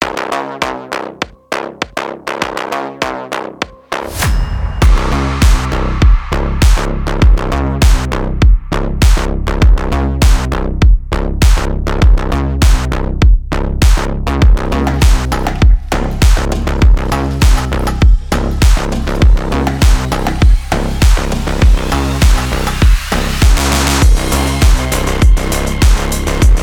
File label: sport electro beat